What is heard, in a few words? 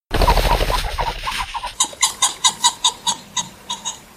pheasant flush